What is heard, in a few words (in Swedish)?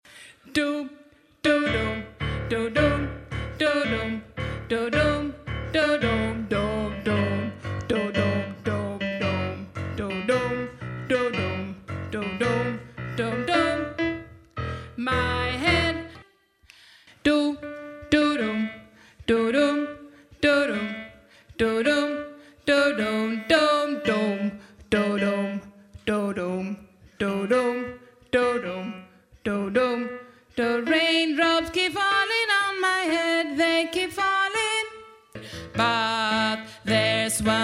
bas, stammor